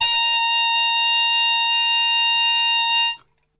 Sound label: A#3, Brass Super Section TRP HARMUTE; Best Service; 90 s Sample CDs